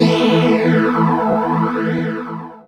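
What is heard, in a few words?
synth chord; E Lab; XTc Files of House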